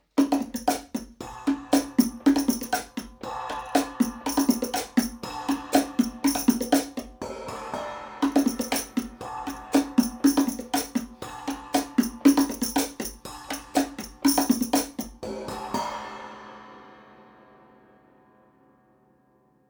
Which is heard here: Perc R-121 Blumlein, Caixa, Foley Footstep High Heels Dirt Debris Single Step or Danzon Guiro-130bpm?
Perc R-121 Blumlein